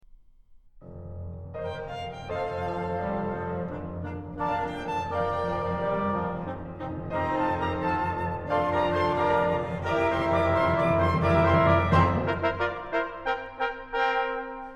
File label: Ragtime Orchestra; Paragon